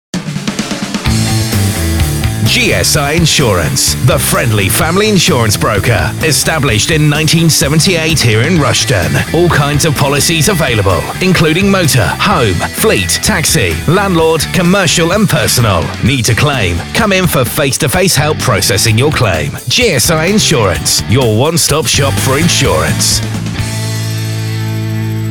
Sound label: Insurance Jingle